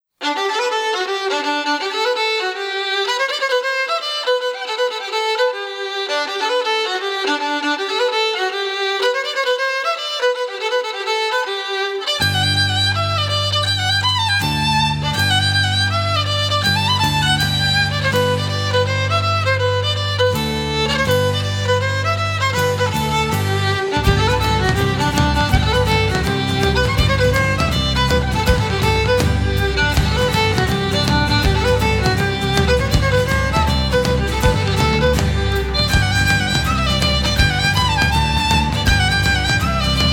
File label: Celtic Rock Band, Reel